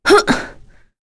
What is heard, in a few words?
Vox Damage; kingsraid; voices heroes en